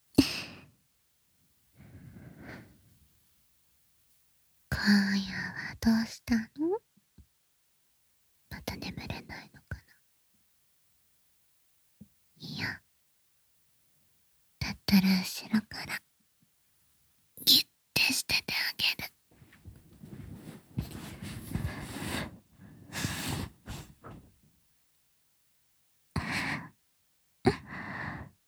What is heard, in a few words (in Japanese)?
密着後ろから抱き着き耳かき